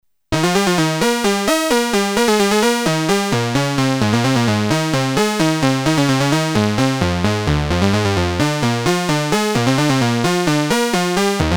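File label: seq music, synth; Polivoks formanta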